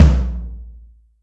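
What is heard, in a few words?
Ambient Tom; Yamaha DTXpress; Acoustic Tom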